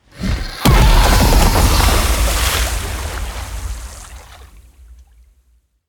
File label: Pteradon knock platform; Level4 Hidden World